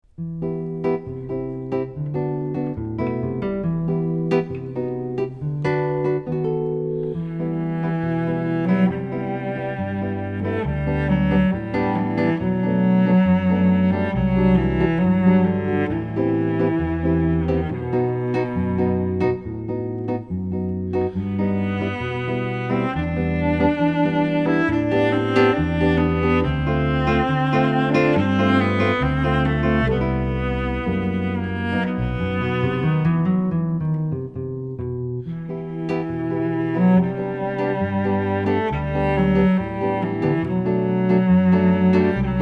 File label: Pavane Cello; Lesser-Known Gems